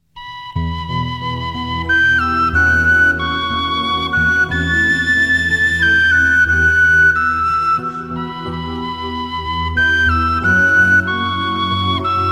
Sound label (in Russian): музыка